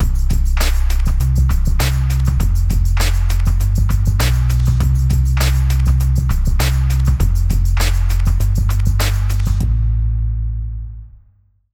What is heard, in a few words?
100bpm light staccato cello ENDING UNDERSCORE